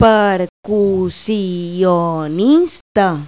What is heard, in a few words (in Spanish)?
PERCUSIO; Pipo-Aprende musica con Pipo